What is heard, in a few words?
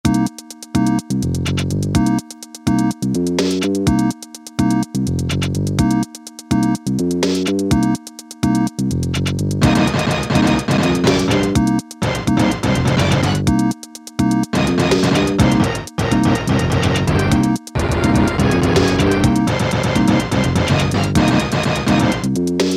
FUNK 2, Casio Rapman rap patterns